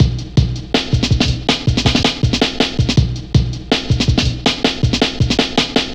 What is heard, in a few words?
Drum Loops, Jungle